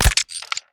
p atk sandtrap

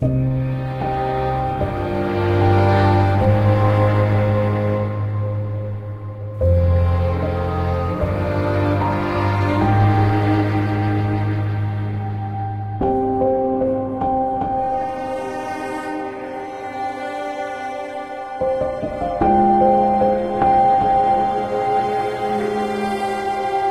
avg desolate loop, music

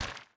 tab switch, ui

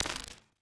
mission choose

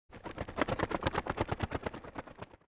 wing

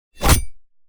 iron claw

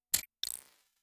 talent unlock

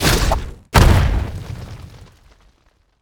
jump hammer

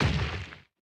walk

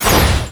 glow sword cast

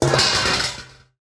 steel basin

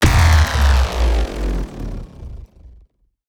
time slowdown

